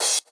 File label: crash